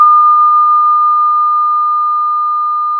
pop, Sound of a church bell

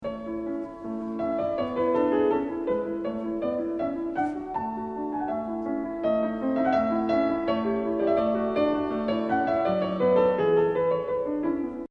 Mozart concerto loop

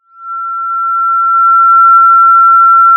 Sound of a police car siren

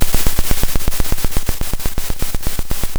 pop, Sound of a drum roll

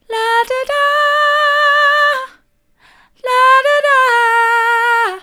la do da soft, vocal

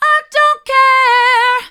i don't care mid, vocal

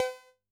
Perc, drum

